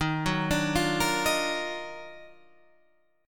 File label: D sharp Minor Major 9th D sharp, Guitar